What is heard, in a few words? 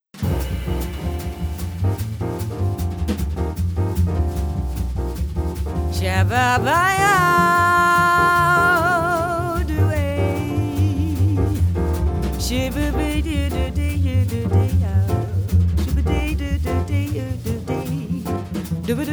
Lullaby